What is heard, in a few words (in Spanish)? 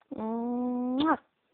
beso